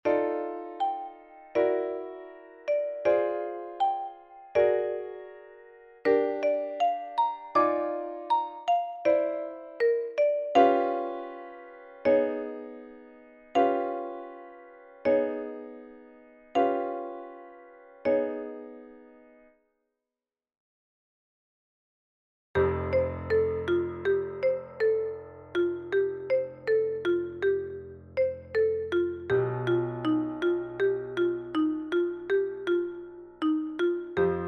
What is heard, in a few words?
for marimba piano; Satie, Erik